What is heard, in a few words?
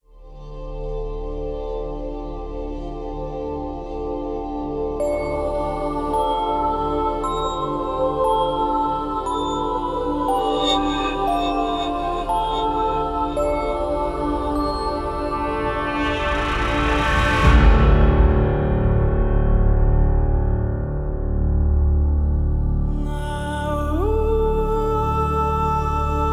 Intromusic; Yono and the Celestial Elephants